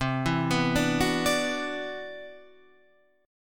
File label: C Major Sharp 11th, Guitar Half Step Down